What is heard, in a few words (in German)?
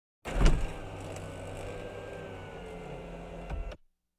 schiebedach öffnen; Auto-Zweiraeder